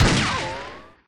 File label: barrel man shoot; GoldMinerJoe